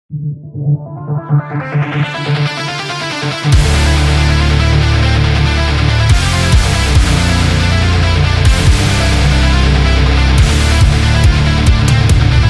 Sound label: Metalcore